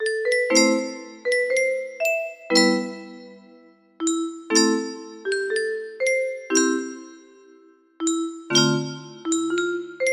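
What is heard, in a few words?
in a minor, music box melody; laputa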